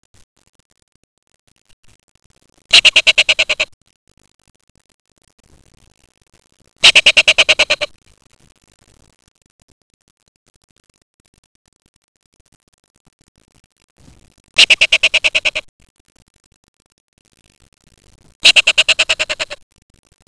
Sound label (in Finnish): orava